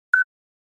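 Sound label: Flash Beep Electronic Tap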